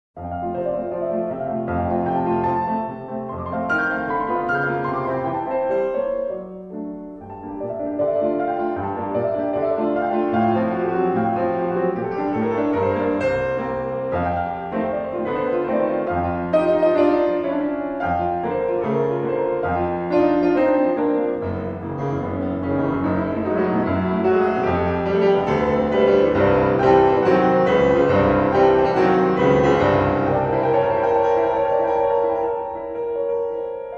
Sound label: Tarantella